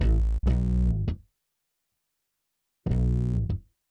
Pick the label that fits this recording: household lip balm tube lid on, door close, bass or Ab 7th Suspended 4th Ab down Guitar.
bass